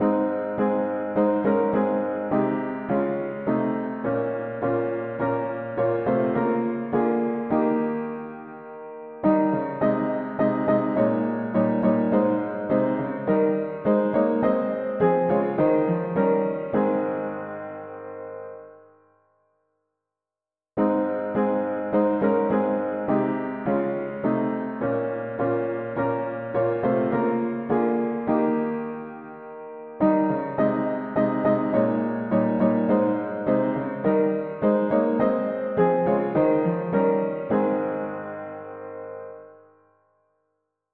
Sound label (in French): Tout dit qu il est merveilleux mono piano